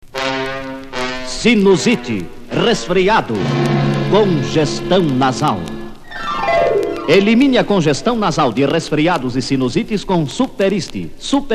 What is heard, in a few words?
Jingle; Superist